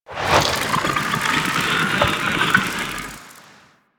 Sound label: Fishing Spear Fish Spook Flying Infested; Warframe